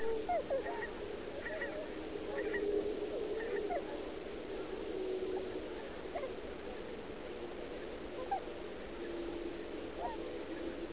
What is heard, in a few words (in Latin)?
cygnus cygnus